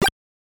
Fireball; super mario bros. 3 1988 nes

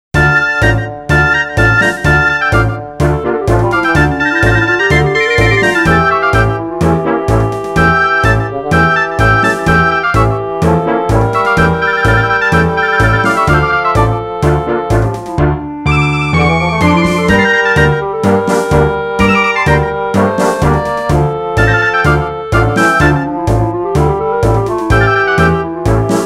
Hi-Hat; rhythm heaven fever complete music collection; Blue Birds

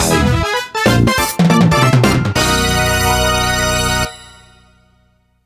Grand Prix - 1st Place (Fanfare Only); mario kart wii

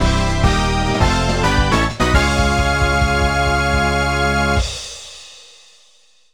1st Place Fanfare; mario kart 64

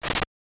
Hammer Bro Movement; super mario bros. 3 1988 nes

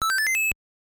Spade Clear; super mario bros. 3 1988 nes

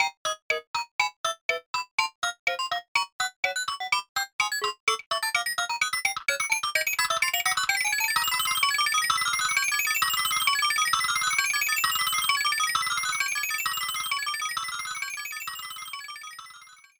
P Switch; new super mario brothers

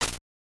Player Spin; super mario bros. 3 1988 nes

